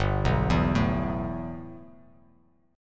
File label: G 6th Add 9th G Bass Standard, chord; G6add9 arpeggiated